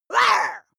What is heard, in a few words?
witch shout short